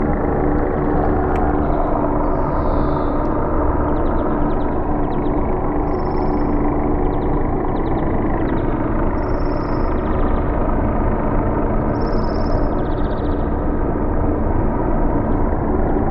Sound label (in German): Hubschrauber